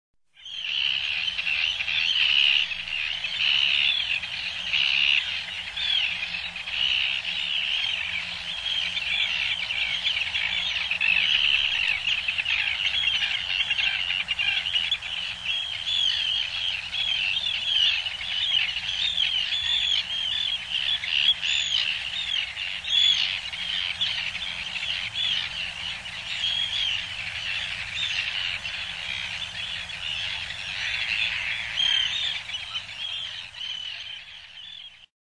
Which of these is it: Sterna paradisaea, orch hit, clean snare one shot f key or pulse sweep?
Sterna paradisaea